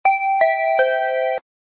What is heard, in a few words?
din don don